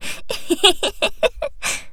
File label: laugh; Eternal Return; Tia; ENG